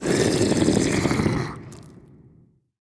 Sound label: zombie pig